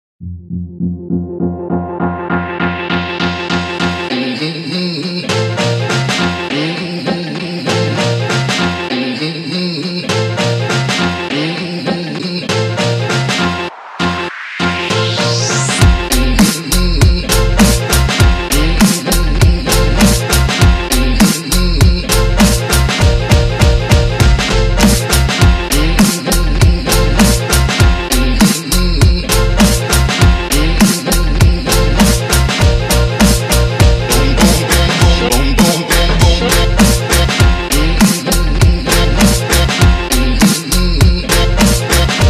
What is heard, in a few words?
Music